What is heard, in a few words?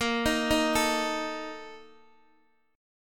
A sharp Major 7th Suspended 4th, Guitar DADGAD